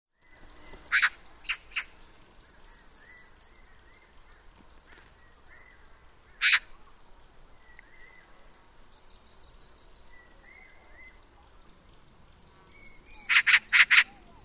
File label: Oriental magpie